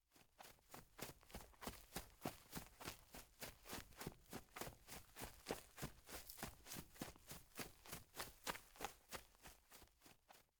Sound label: Running; the 39 steps